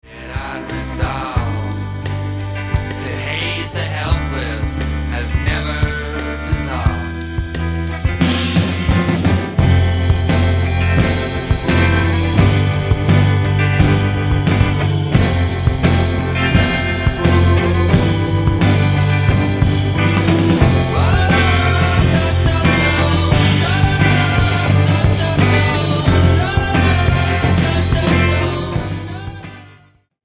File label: silver age drum